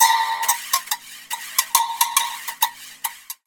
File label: Loop Synth Closed Brush